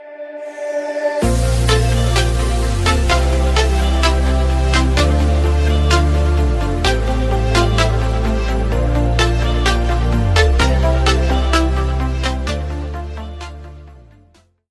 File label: intro music; azur lane; april fools 2020